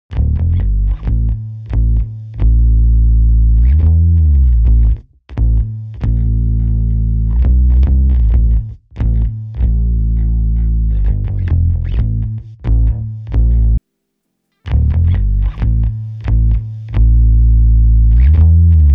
BASS cleanup

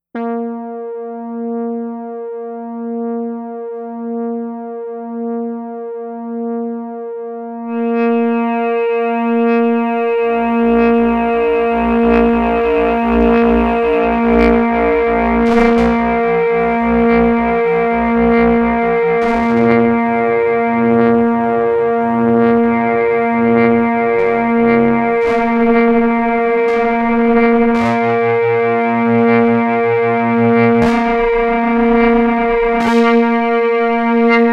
AM Synth Modulator

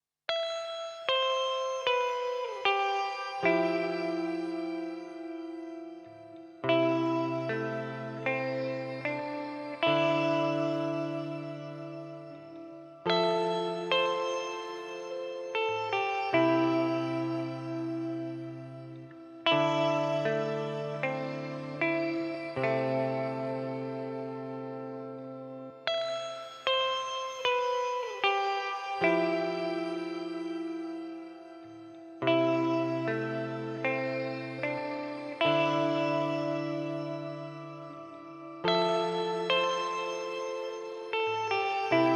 Shimmer Reverb Meris Mercury 7

